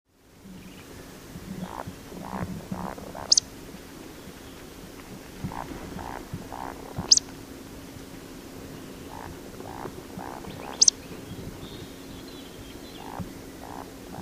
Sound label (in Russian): valdshnep golos